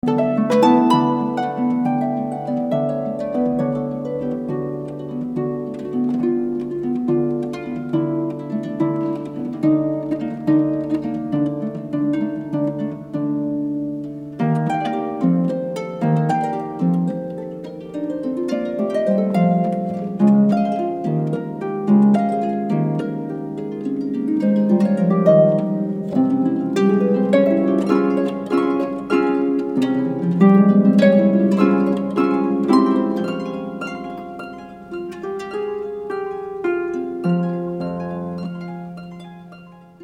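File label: Pedal Harp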